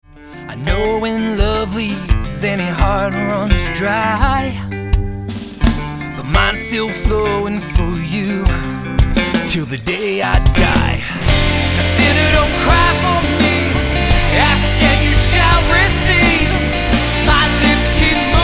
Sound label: melody